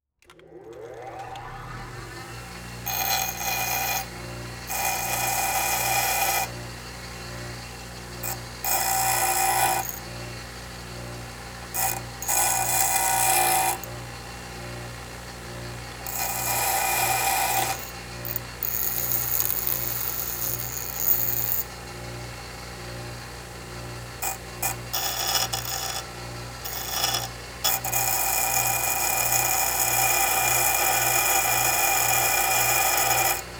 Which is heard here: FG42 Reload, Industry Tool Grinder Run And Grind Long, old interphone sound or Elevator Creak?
Industry Tool Grinder Run And Grind Long